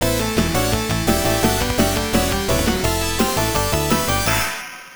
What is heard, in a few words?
Boss Clear; Aurail; System 16B; arcade